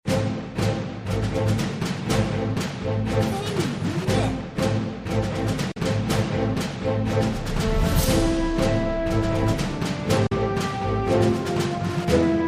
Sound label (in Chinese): 气势-恢宏-壮阔